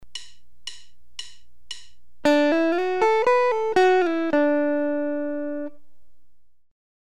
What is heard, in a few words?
Changing positions with slides, guitar